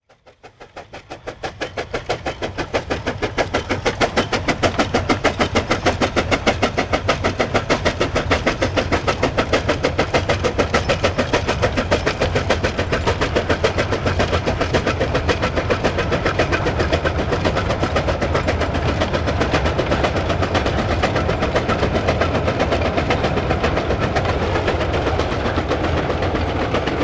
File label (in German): dampflok sound china